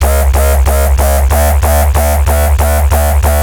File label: Bassline, Dubstep and Trap